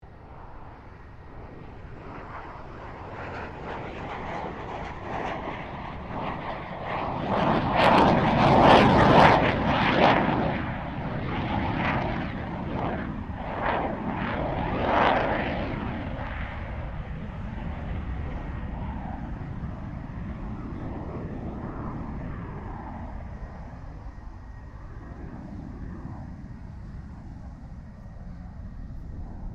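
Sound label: FA-18 Hornet maneuvering; RAAF FA-18 Hornet; Airplanes; Vehicles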